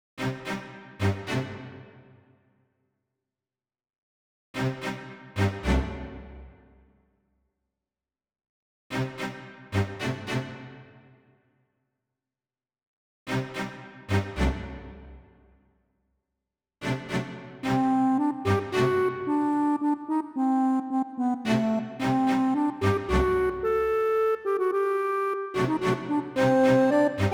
Night (w-o Ambience); Donkey Kong 64; Fungi Forest

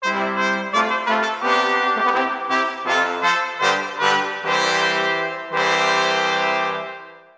outro music; Battle of Europe

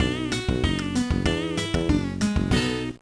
Jingle, gba; Backyard Baseball 2006

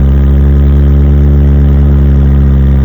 Car Med Loop

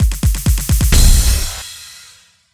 Transition; Everspace 2

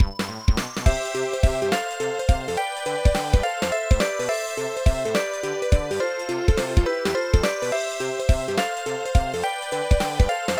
BGM Success; Grand Trucker Aniki Shigoto to Kenka to Koi Moyou; NDS

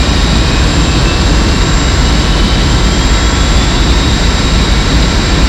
Jetcar Med; Nicktoons Nitro; arcade

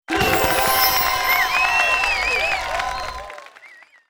ui collectibles unlock all

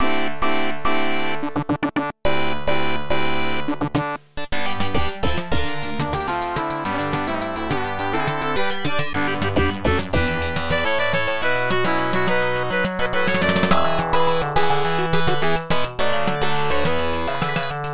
victory theme; X-Men Madness in Murderworld; IBM PC AT

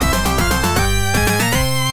Boss Stage Clear; marvel land talmit's adventure genesis

